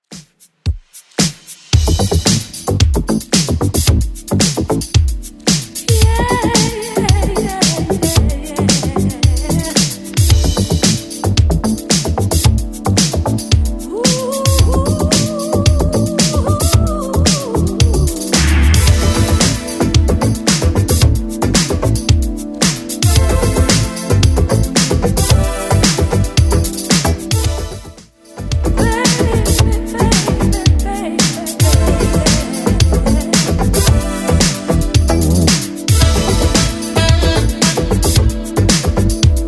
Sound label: 80s 112 bpm; Redrum